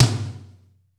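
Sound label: tom, funk kits; Crypton - DrumBase5000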